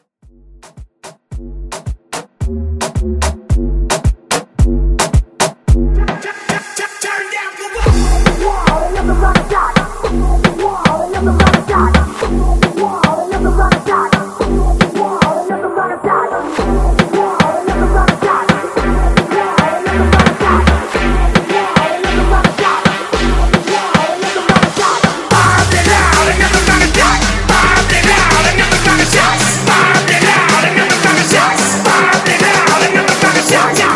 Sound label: Moombah MashUp